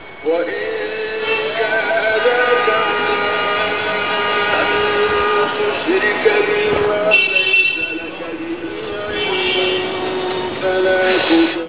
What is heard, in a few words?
cairo